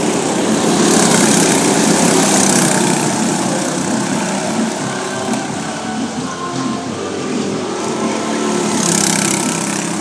karting woo hoo

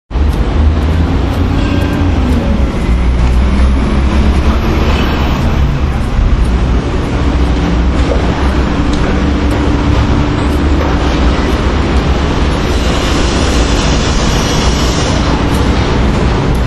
sounds of oxford road manchester

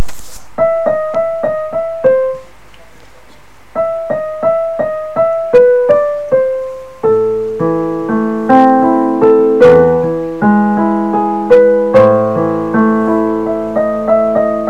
learning fur elise

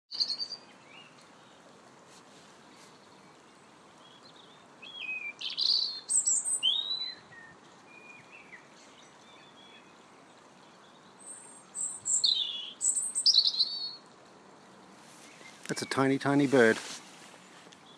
bird noise

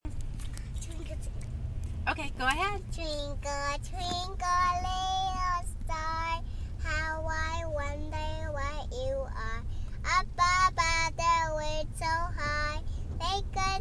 three year old sings twinkle twinkle

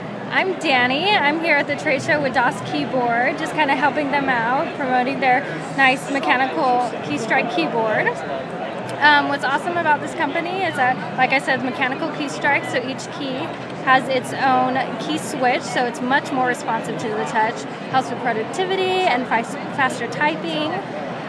keyboard; das keyboard

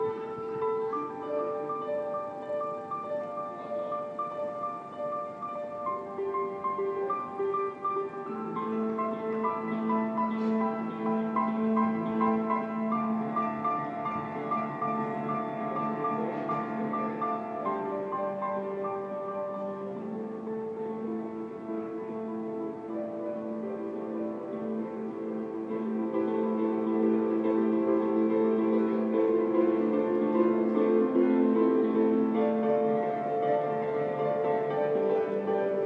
guy playing a public piano; st paul s

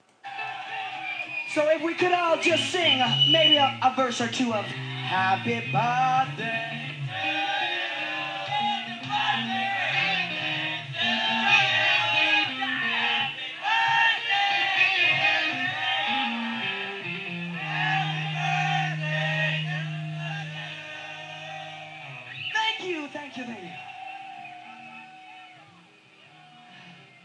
singing of happy birthday

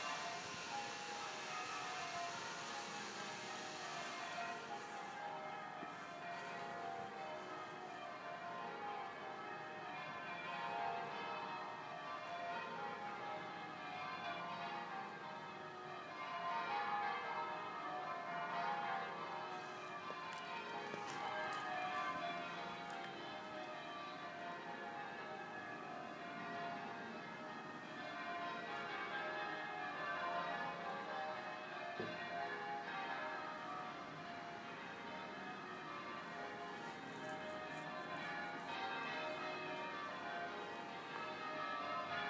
sunday bells

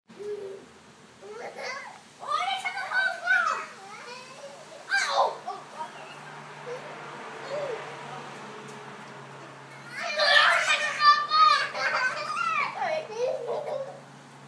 giggling in the front yard

